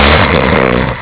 snore

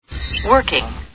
computer working; Star Trek TNG

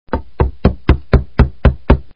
door knock

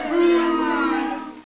boo, audience

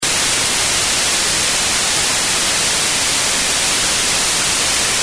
white noise

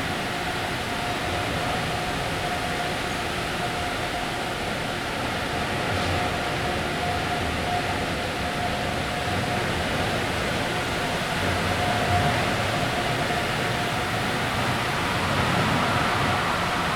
Carwash

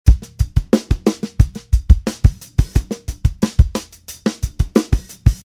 drums phase bassy

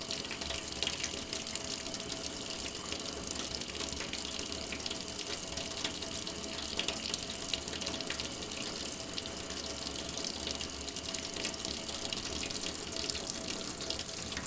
Tap Basin, water

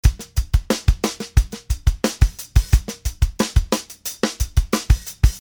drums dry

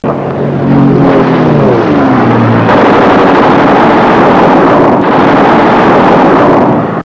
spitfire guns

